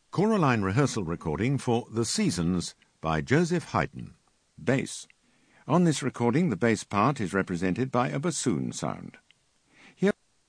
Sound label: Bass; Haydn Seasons